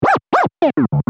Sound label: Scratch